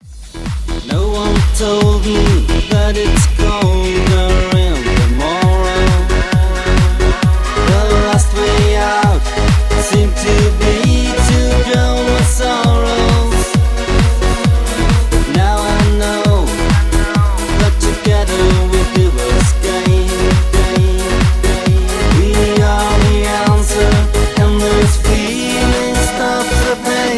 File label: Electro